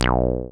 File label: SYN 303, Roland TB-303